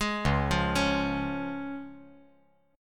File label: C sharp Major